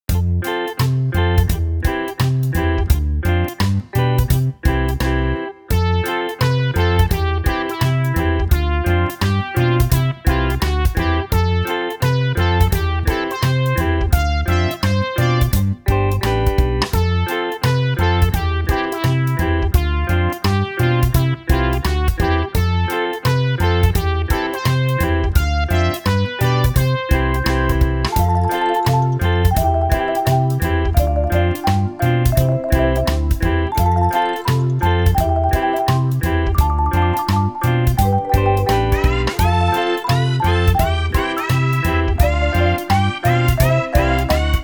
loose games

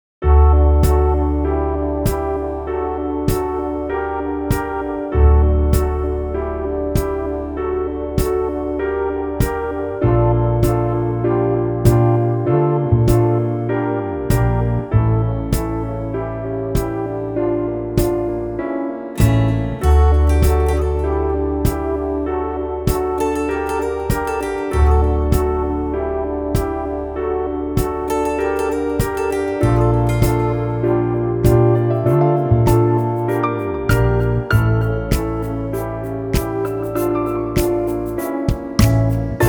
keep walking in that meadow

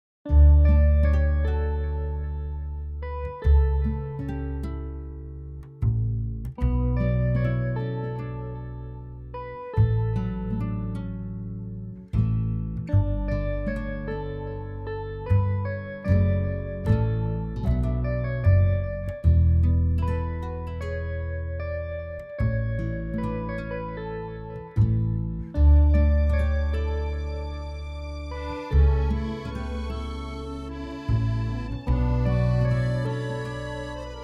Autumn dusk, bgm

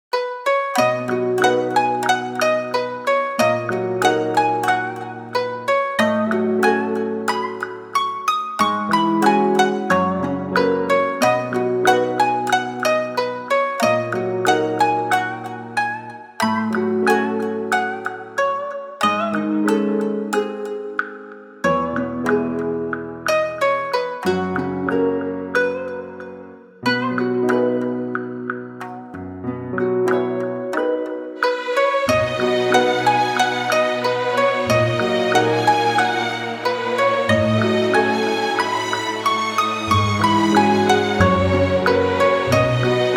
Hot spring inn

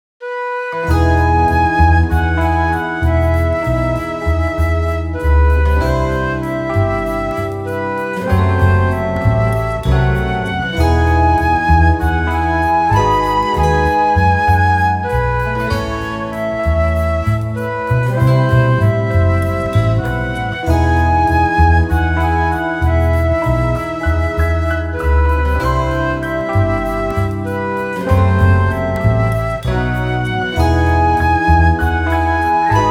See You Tomorrow, bgm

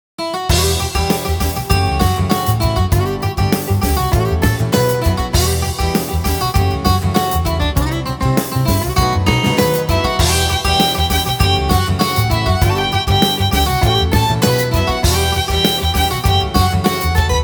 Plateau, bgm

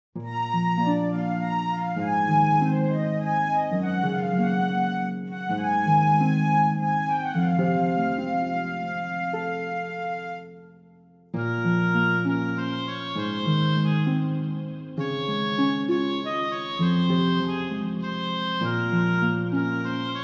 highway at night

